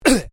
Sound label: MALE VOCALIZATION UGH; Exclamations; Voice